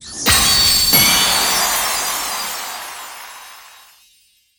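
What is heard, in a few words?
Sound Effect System Reward Ranking 1st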